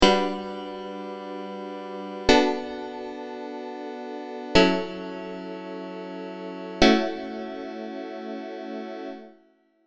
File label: serum chords; chiqui audio